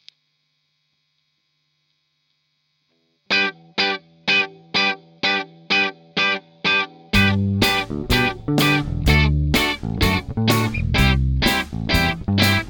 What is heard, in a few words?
karaoke